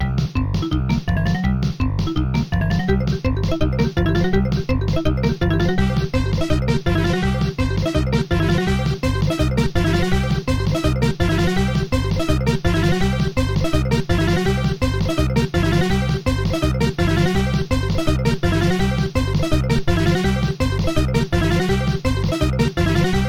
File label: Bonus; Adventures of Rocky and Bullwinkle and Friends; Data East; pinball